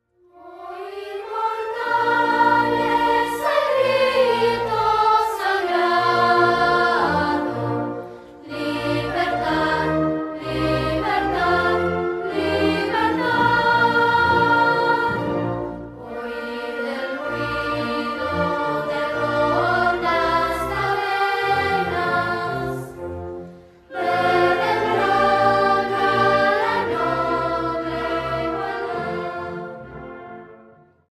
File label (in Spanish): HIMNO CANTADO POR NIÑOS; 25 DE MAYO